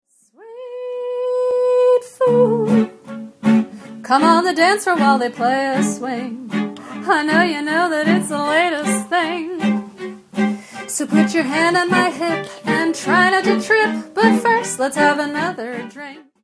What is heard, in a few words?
Bistro Jazz w guitar string quartet